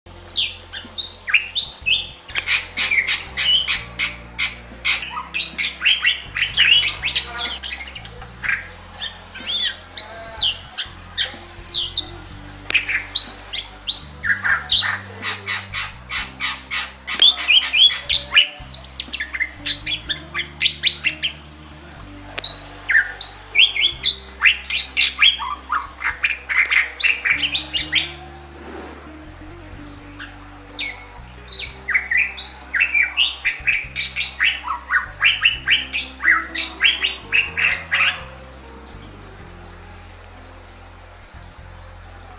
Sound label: Bali myna; Leucopsar rothschildi; CR; silentforest